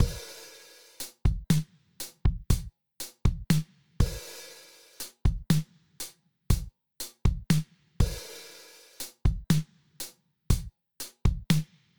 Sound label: Lowenhance on Kick